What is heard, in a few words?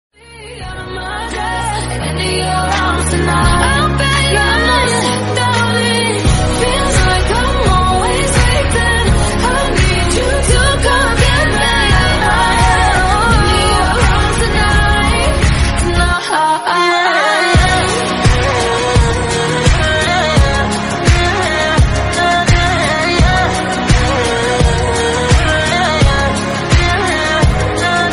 romantic ringtone